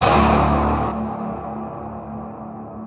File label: Hits; Silant Hill